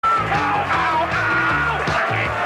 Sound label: ow ow owww